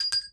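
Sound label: Bullet shell Shell Eject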